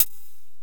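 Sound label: Closed Hi-Hat, Dance Drums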